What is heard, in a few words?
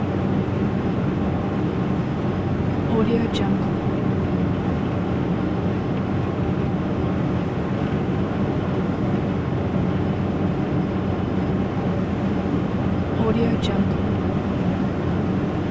ferry engine running